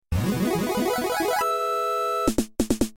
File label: 8 bits sound